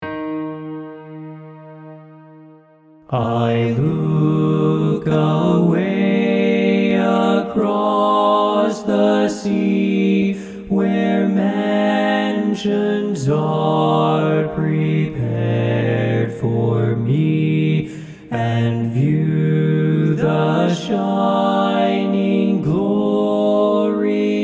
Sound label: BASS